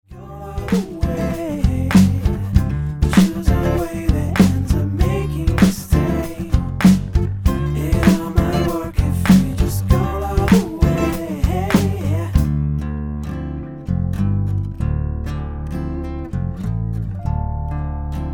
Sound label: POP